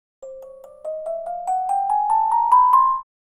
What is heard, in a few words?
Cartoon ascend music box